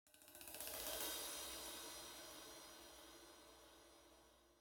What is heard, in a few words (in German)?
Spannung Start